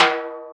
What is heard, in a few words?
EQ Perc, Percussion Mix